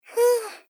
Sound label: Polite Rest Fuuu; Rei Yumesaki